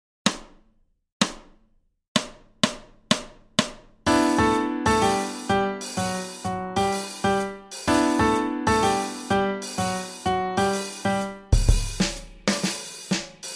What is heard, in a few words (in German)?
rhythmus pattern i